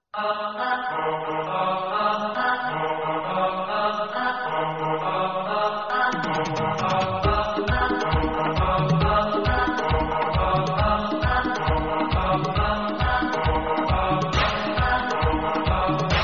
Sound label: Tone